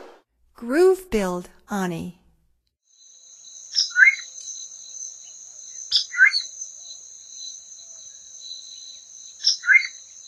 Groove-billed Ani; North America; Stokes

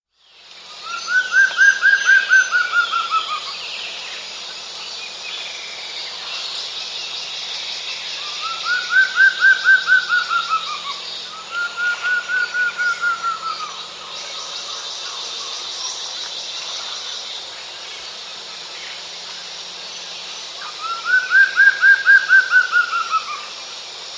Plumbeous Antvireo, S. America

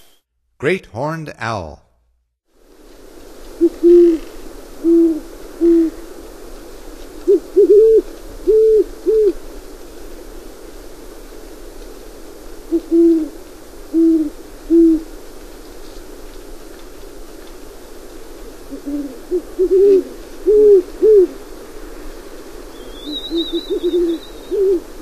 Great Horned Owl; North America; Stokes

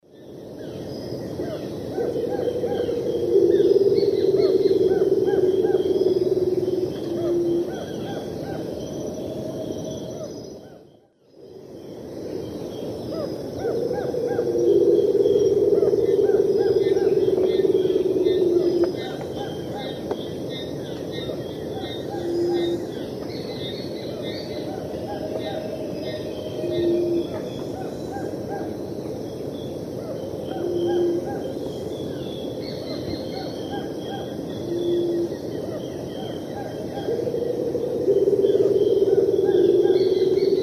Baryphthengus martii, roll duet; S. America; Eastern Lowlands

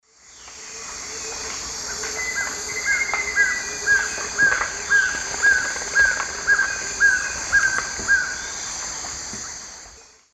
Trogon violaceus, song; S. America; Eastern Lowlands

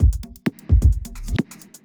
electro kit 130

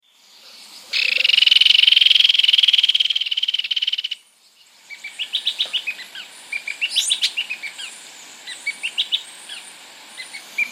Guianan Streaked-Antwren; S. America

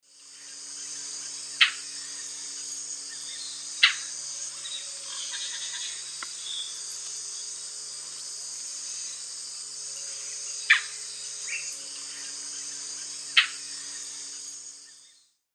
Automolus rufipileatus, call; S. America; Eastern Lowlands

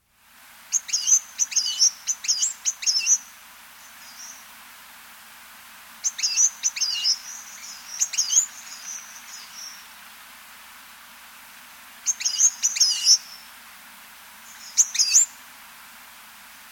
Blue-and-gold Tanager, Nature's Songs, S. America

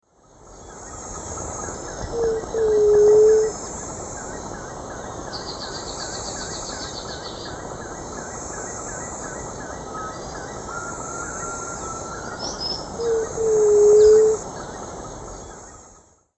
Leptotila verreauxi, song; S. America; Eastern Lowlands

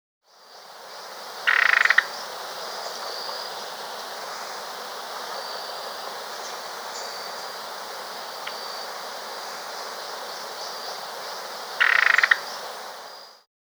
Ochre-Faced Tody-Flycatcher (Todiros; S. America

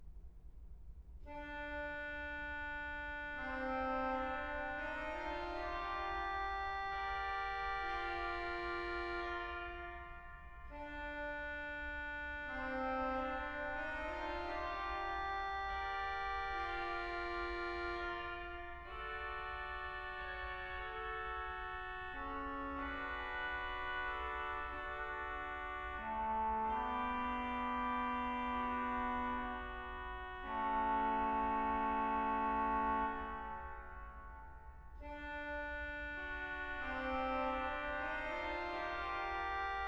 organ; Franz Liszt; Offertorium

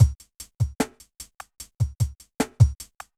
hiphop

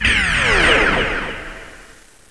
FLY BY, SPACE

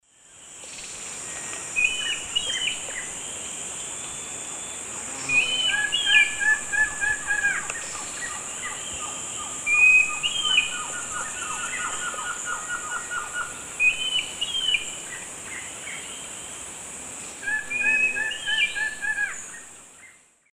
Hyloctistes subulatus, song; S. America; Eastern Lowlands

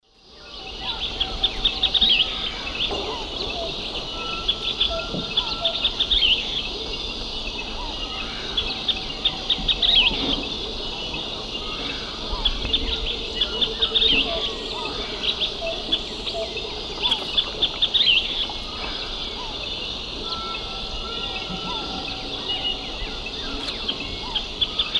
Myiozetetes granadensis, dawn song; S. America; Eastern Lowlands

